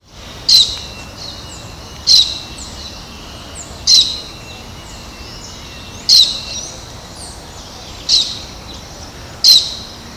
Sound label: Brotogeris tirica; Ibirapuera Park; SP; Brazil